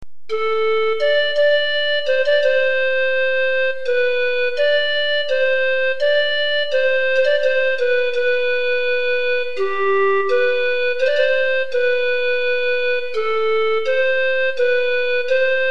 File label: chechen folk music